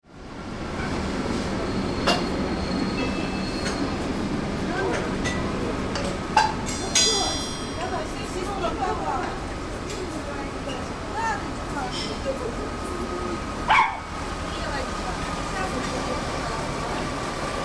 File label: train sound check